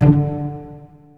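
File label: cello pizzicato D2, Virtuoso Strings; ILIO; Kirk Hunter Virtuoso Strings VOL-3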